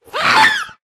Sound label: scream, ghast; mob; Minecraft